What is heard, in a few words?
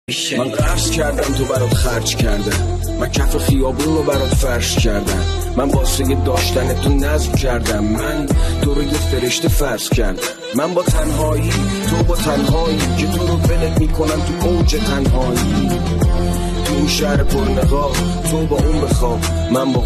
ghamgintarin ahange donya